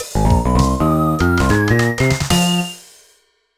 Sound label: Level Clear! Fanfare; mario vs. donkey kong 2 march of the minis; mario vs. donkey kong 2 minimini daikoushin; nintendo ds; 4th Floor Fanfare